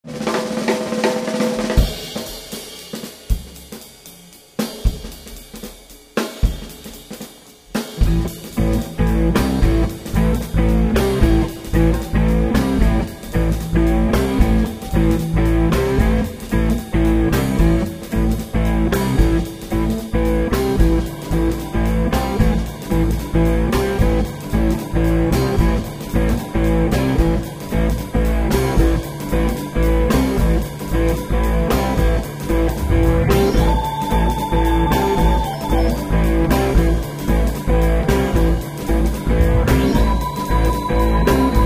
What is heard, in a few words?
Rhodes; Union Square Group; Rhodes 64 (Front Side); Live 2002; triton